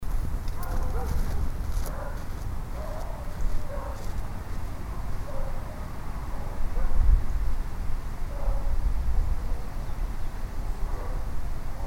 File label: Dog bird scape